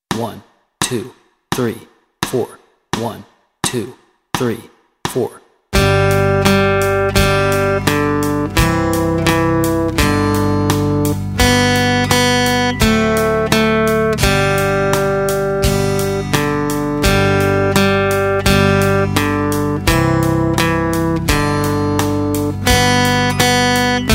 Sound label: Old MacDonald Melody and Chords Track, guitar